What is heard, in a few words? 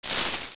Skulltula Ascend; zelda; OOT